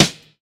dry snare drum sample d sharp key, acoustic snare sound tuned to the D# note